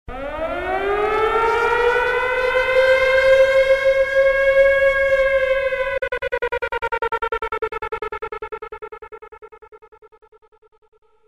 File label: War horn (chopper)